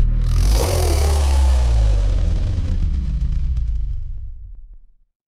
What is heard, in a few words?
mechanical gear